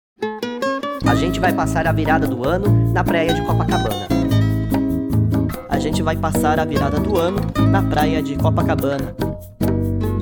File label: Jingle